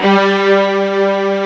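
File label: pop strings G4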